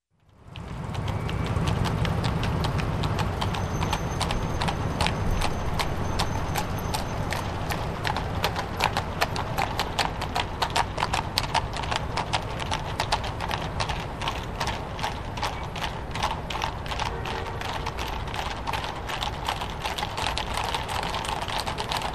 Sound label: Horse Carriage in the city